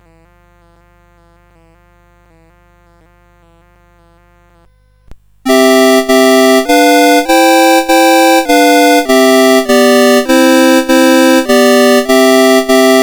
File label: Ode to Joy, CSG8580R5